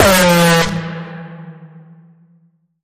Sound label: Bend The Alarm F